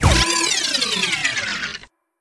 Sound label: game over